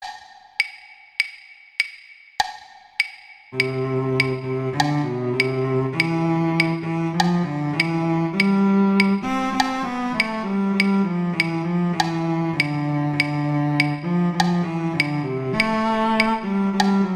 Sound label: Cello II; Duets; Mozart; Duet 5